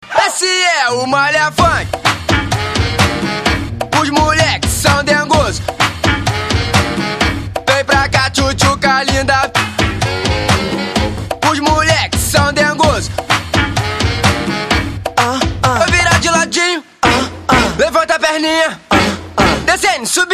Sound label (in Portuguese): malha funk